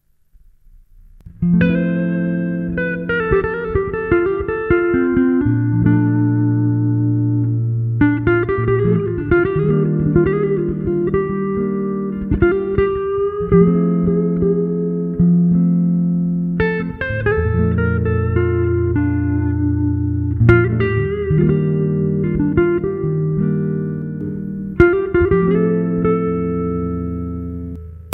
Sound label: jazzy